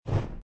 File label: fire pea